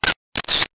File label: CAMERA